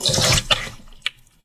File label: gut SQWCH